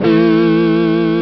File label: FZ1 e guitar, Amiga Soundtracker Sample Packs